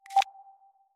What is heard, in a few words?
camera focus, ui